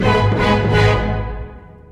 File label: G sharp 3 int Tr 8th